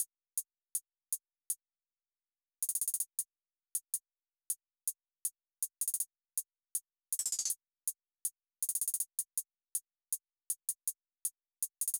Drum Loop, 160 BPM Hihat; Loop Stems; Cymatics; Vibes II Drum Loop Collection; Positive

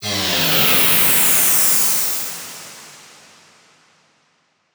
Techno FX 135

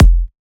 Kick - F; House; Drum One Shots; Taurus Kick

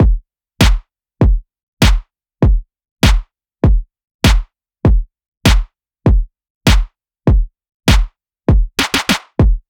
Phonk 99bpm; EDM Drums; Drum Kits; Stems; Ghosthack; Drum Mega Bundle Demo; Ultimate EDM Drums; Phonk Kit 03

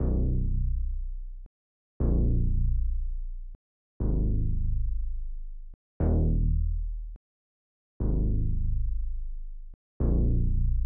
120 BPM D# Min Bass; Loop Stems; Cymatics; 2022 Melody Mystery Expansion; Slowly Drifting

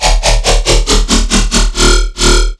Riddim Bass Hit D#

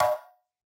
Vintage Percussion Shifted Hit; Cymatics; Mystery Pack Vol 4

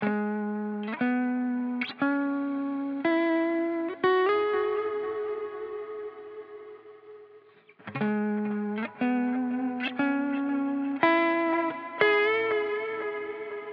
120 BPM E Maj Lead Guitar; Cymatics; Miami Guitar Pack - Pt 2; Losing

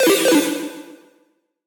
Impact FX; Video Game; Galaxy Rush; Cymatics; Video Game Sample Pack Vol 1

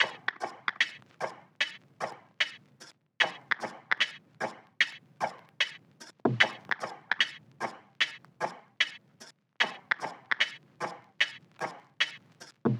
Percussion Loop 75 BPM; Cymatics; Eternity Sample Pack

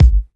Kick, House Drum One-Shots; Ghosthack; Advent Calendar; House Kit

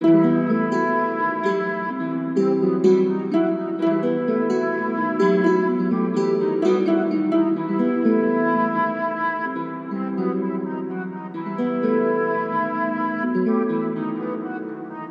Melody Loop - 127 BPM E Min; Cymatics; Oracle Sample Pack

